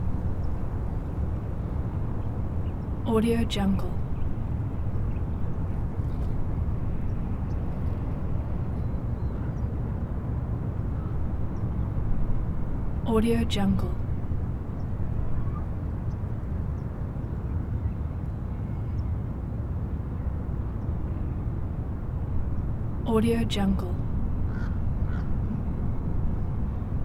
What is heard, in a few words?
distant city traffic; prosoundfx